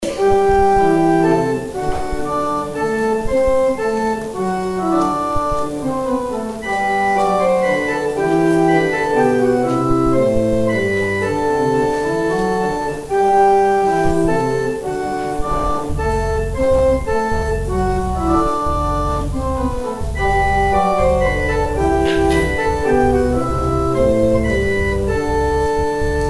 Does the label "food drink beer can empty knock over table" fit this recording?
No